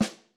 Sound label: snare f, Snare